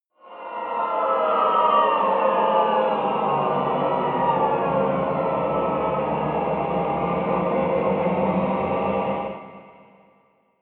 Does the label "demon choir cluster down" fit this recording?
Yes